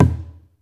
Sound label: ETHNIC DRUMS; Spectrasonics; Liquid Grooves 1 & 2